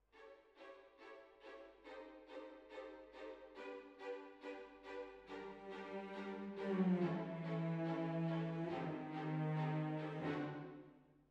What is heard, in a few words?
Hall